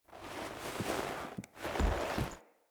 Foley Idle Variant; Friendly; Orlick

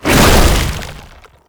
tank infested charge launch; Enemies; Abilities; Warframe; Lasrian Tank

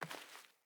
Sandals Walk Vegetation; Player; Footsteps

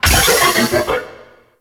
Shell Pillar Door Open; Warframe; Corpus; Railjack; Comet Shredder

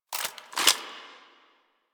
Gun Move Foley; Frumentarius; Warframe; Ambience; Nineteen Ninety Nine

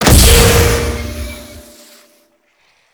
Shot; Warframe; Grineer; Ogris

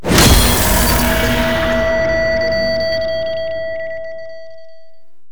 Painting Complete; Gameplay; Warframe; Shadowgrapher

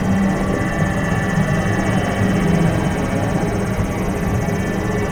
Gas Core Loop; Warframe; Corpus Gas City; Ambience

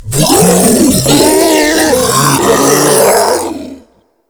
Infested Ancient Toxic Tentacle Swing Scream

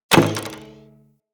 Bow Shot Twang; Weapons; Player; DendritBow

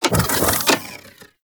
Umbilical Machine Arms Disengage; Hub; Liset Machines

